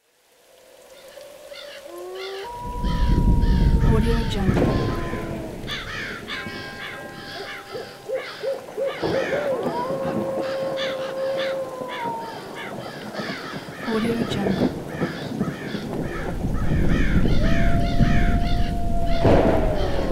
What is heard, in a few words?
halloween ambience